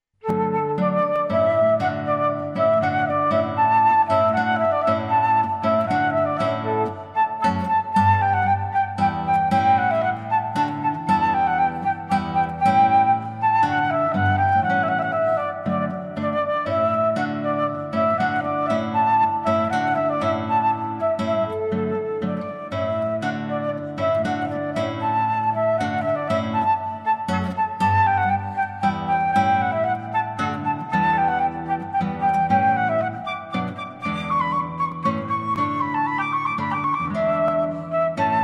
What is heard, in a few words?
Hornpipe